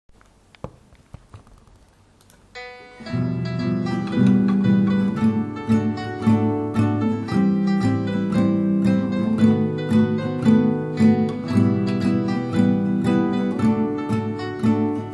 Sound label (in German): Melodie und Gitarre